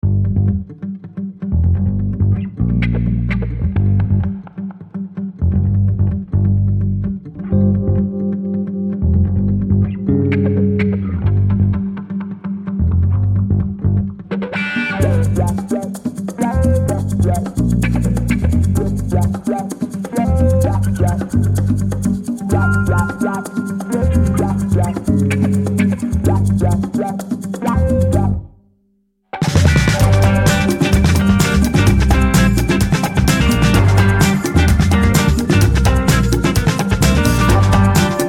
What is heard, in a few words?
Bass Groove